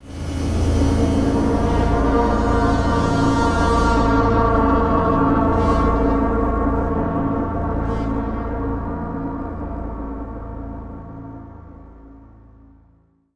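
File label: sting, brass swell; transformers war for cybertron gamerip; MEGATRONSPOWER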